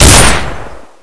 colt fire

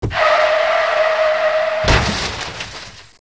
crashed

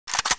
sidearm reload

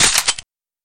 boltslap; paranoia

